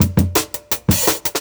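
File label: Jungle Breaks, 170 bpm; Input; Original Jungle Breaks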